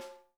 snare; Sonic Reality; Interactive Drum Kits

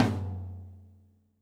tom, Drums Acoustic; USB Soundscan vol.10; AKAI; 90s sample CD; DYNAKIT 2

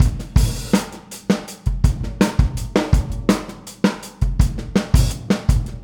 Boogaloo, Full Mix, 82 BPM; Drums; Verse; Sonic Foundry; Drums from the Big Room The Mixes

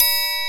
Triangle A#3; Proteus 2000; Multisamples; Digital Sound Factory Proteus 2000

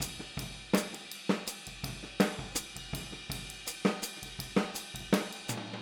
Boogaloo Chorus Hi-Hat, 82 BPM

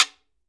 GUIRO, PERCUSSION; Sonic Reality; Interactive Drum Kits

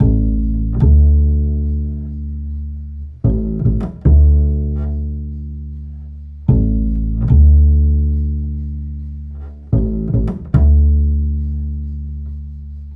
Acoustic Bass

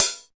HAT; East West; Phat & Phunky

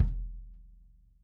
kick

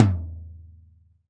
RAW TOM, Drums Acoustic; AKAI; 90s sample CD; USB Soundscan vol.10; RAW KIT 3

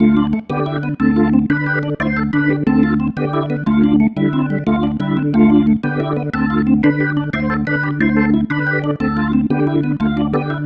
Pattern, Organ; Loops; Sonic Foundry; Joe Vitale Organ Donor

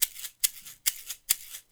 140, Percussion; LOOPS 140; Sampleheads - NYC Percussionworks

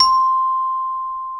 Celeste C5; Digital Sound Factory Virtuoso

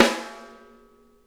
RIM, Drum Kits

